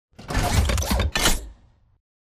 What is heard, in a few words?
combat idle to run forward; Titanfall 2